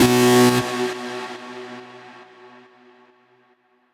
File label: Soothsayer Kit 138 BPM Square Alarm; Sound FX; Bminor